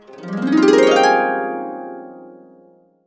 Complete Harps; USB Soundscan vol.62; AKAI

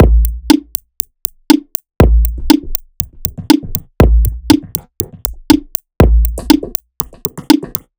Old Skool Electro, Drum Loop; Sonic Foundry; The Electronic Music Manuscript Collection; Drum Loop Structures

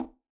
Conga, 120bpm, Old Skool House; Big Fish Audio; Construction Kits; House of Amnesia

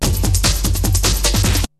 BREAKBEATS, TECHNO

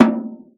sfx perc

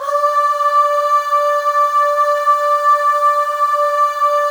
Voices, FEM AHS; Spectrasonics; FEM.1 AHS

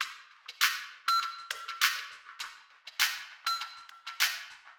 Ghost Loop 100BPM, drum loop

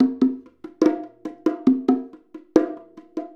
Bongo; Essential Percussion

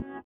sitar, Indiatek; Spectrasonics; Metamorphosis

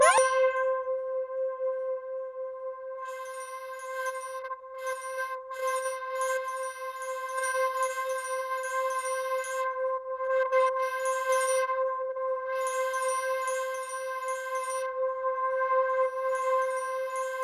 C5, Synth Line; 90 s Sample CDs; NiceBeats; SynthLine VOL-17

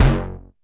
arpeggio; Masterbits; Vintage Voltage; SEQ-FX

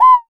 Hoo Low, Cuica, Percussion; Roland BK-5

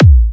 A, kicks; markus hakala kicks

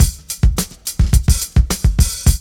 LOOP; X-Static Goldmine 2; IMIX LOOP

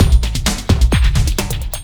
Beat, Drums & Percussion; Electrocution; Beats

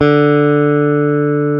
GTR, Dual Guitar; Club-50 - Foundations; Paul

